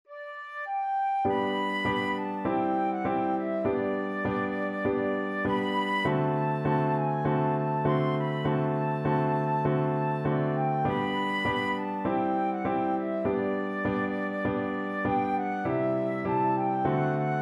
Aloha Oe, flute

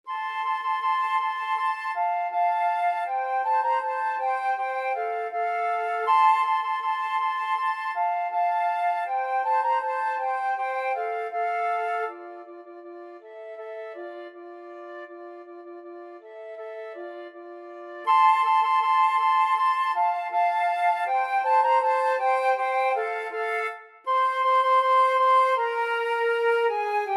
duos marcia FL duet, flute duet

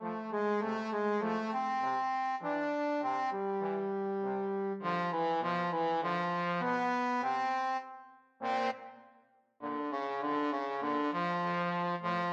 rag trombone duet

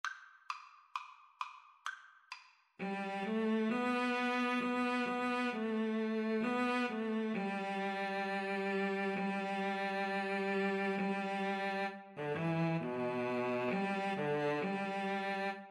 this little light, Violin-Cello Duet

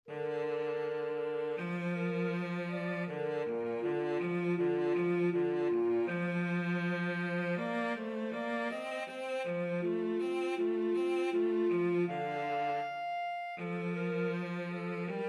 clarinet cello duet

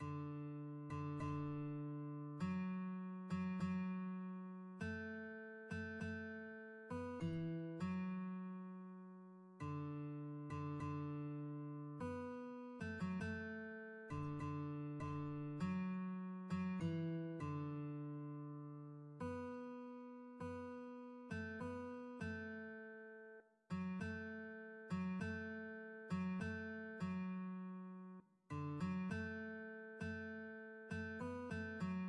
steal away guitar

